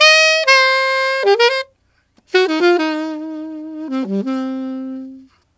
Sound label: Alto Jazz Waltz in C min, Sax; Sonic Foundry (Sony Creative Software)